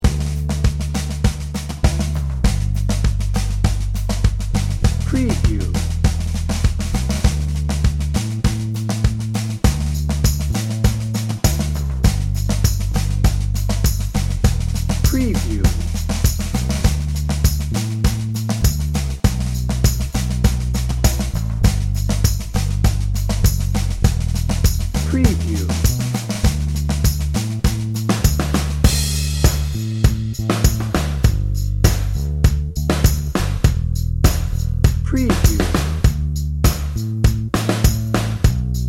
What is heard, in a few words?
americana morning